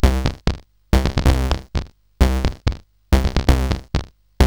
Fire Breath, CSG8580R5

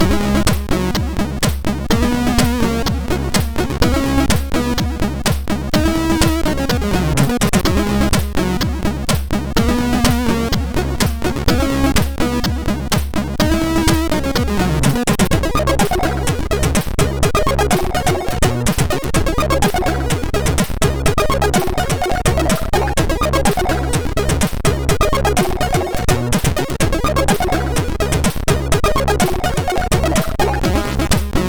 Music Test 3, MOS6581R2

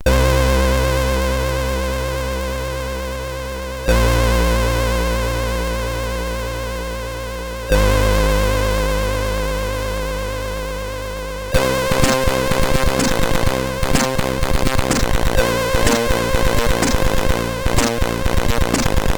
Loader, sid, CSG8580R5; Ocean Loader 4; hvsc

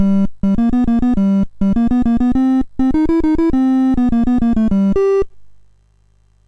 MOS6581R2, GAMES; Sammy Lightfoot

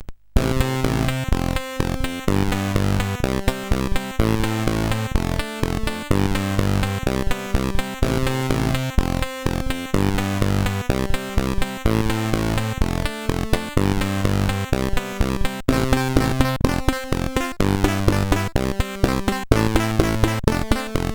Ducks 25Hz, CSG8580R5; Hairdog; hvsc

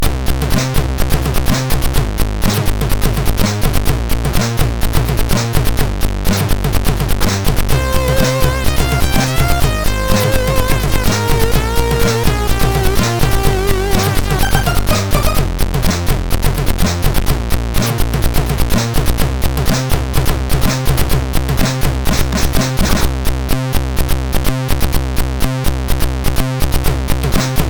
Demo Music, sid MOS6581R3; hvsc

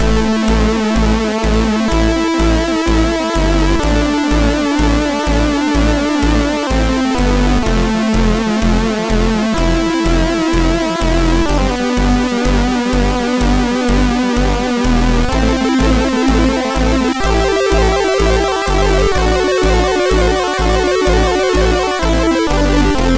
sid, MOS6581; MOS6581R3; Luftrauserz

